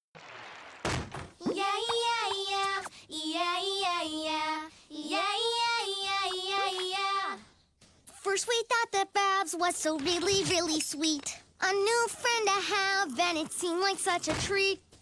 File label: Song, Vocals Only